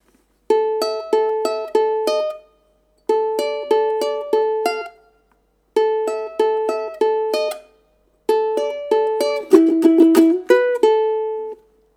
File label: Gone Riff, ukulele